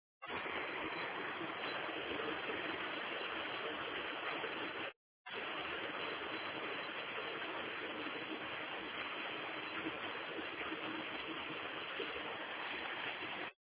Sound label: Rain in Gospic